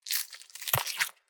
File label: Gore Crunch Bite Rip; Just Gore 2; soundbits